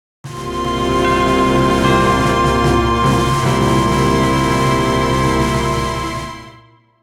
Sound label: National Anthem (Alternate); Advance Wars 1+2 Re-Boot Camp; Blue Moon